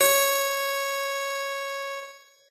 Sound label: piano; pianojs